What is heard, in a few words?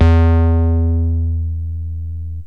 kick bass, yamaha rm1x synth